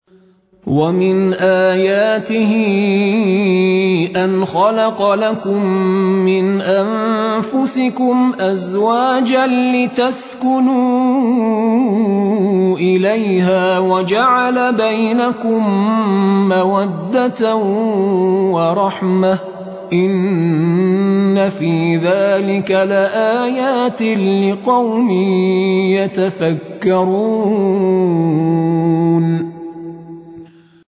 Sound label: Tar